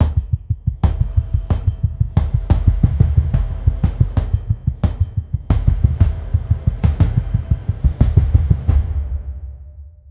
intro dancers appr, musical

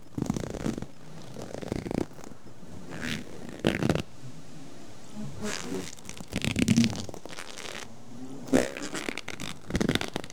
creepy crawling sounds wo

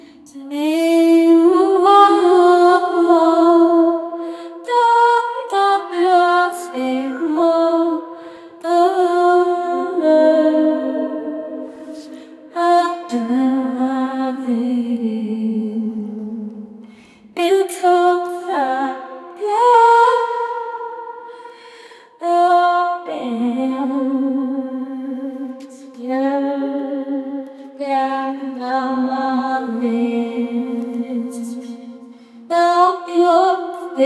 singer songwriter female, acoustic

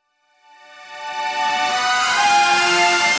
the sound for the logo, branding

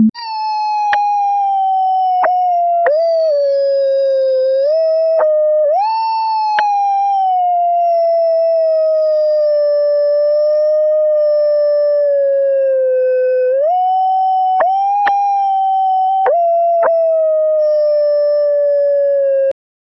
jungle nature

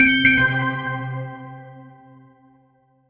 reward sound round, achievement

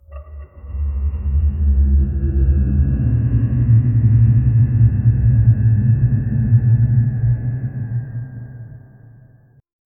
an eldritch deity rumblin, cave

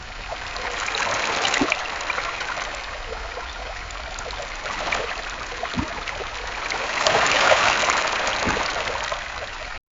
fish swimming, aquatic

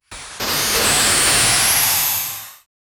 machine building up sound, assembly

